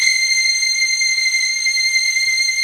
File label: SPARCO VIOLIN